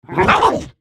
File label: laugh